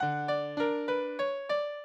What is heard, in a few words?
minuet, mozart